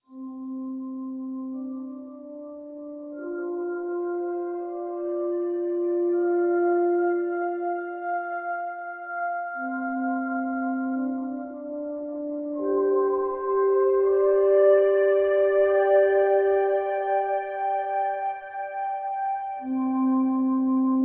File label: Dawn Music; creativerse